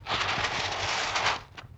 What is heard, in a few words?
Sandpaper Skids; Vintage Cartoon; Foley